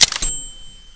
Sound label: metal arena supply; cstrike; csnszombies